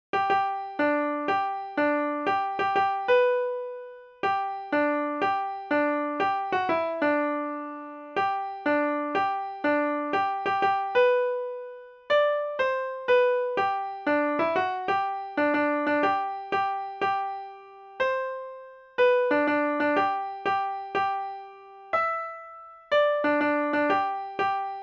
Noël Jazz

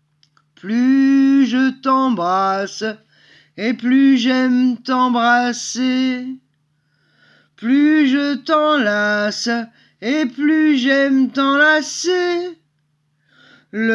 a capella

